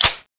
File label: clip in; tfa cso; m1918 bar